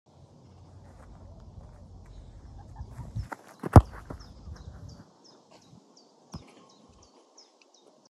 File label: rugby ball